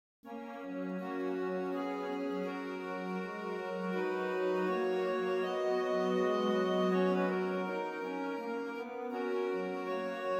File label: Chant bearnais, harmoniums; Franck; LOrganiste